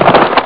fall hin; Amiga Soundtracker sample

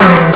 schild; Amiga Soundtracker sample

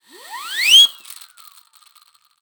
TROETE, percussion; Best Service ProSamples vol.42

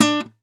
KONZERTGIZ, Alpine Volksmusik

